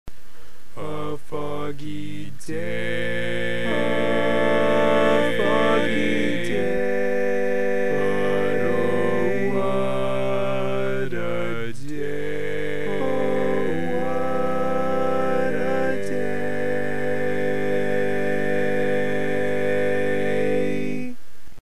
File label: All Parts, barbershop tag